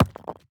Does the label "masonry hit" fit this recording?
Yes